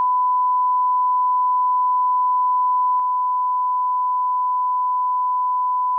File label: soundproof effect 1000hz; 遮音(防音)シートの防音性能; 低減値は小さいですが、聴感的には、2〜3枚貼りから壁の遮蔽感(存在感)が強まり、隣室からの音が「くぐもった」感じになります。; 4枚重ね